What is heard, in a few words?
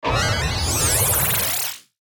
Power Up Hit Synth Sputter Digital Ascend; Rise And Dive; Blastwave